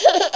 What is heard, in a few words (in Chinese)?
婴儿; 笑声